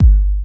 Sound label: deep dance kick; Kicks; single hits